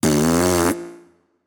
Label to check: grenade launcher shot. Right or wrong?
Wrong